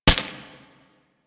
Mech Full Auto, Pistol; Weapons; Grineer; Amphis

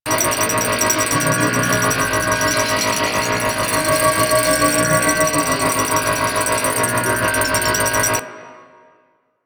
Heavy Block Ability Loop; Warframe; Duviri; Dax

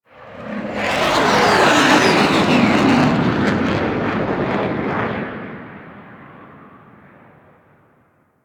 Ship Fly By E; Sentient; Warframe; The New War; Plains Of Eidolon; Mission One

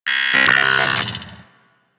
Vocal Idle; Warframe; Amalgam Moa

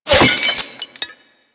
Glass Impact; Warframe

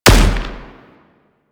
Anti Aircraft Gun Shot D; Weapons; Warframe; Archwing

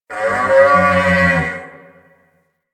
Ram Ambient Call; Animals; DE; Warframe; Quests; Duviri

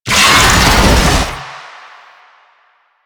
Infested Oni Melee Start; Warframe

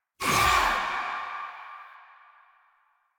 Choir Erupt Proj Throw; Warframe

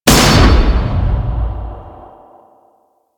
Impale Proj Explosion; Warframe; Warframes; Hoplite

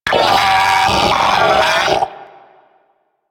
Arachnoid Vocal Death; Enemies; Vocals; Warframe; Corpus; Arachnoid Hunger

